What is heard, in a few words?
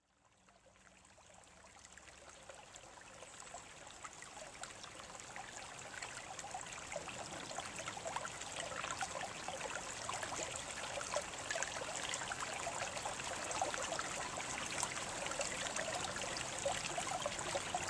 waterfall